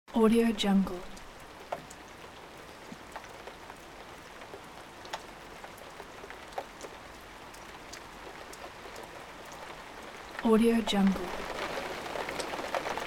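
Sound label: winter rain with melting snow behind window loop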